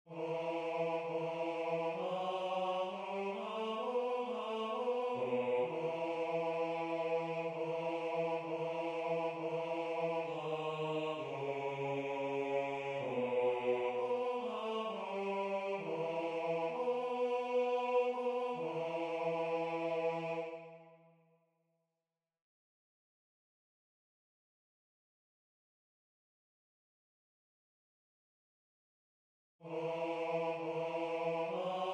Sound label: Jesu Basse; Buxtehude